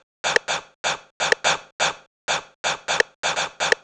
drum loop breather percussion 125, Classic Chicago House; Sample Magic; Drum Loops